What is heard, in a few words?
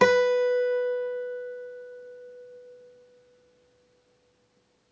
string 1 fret 1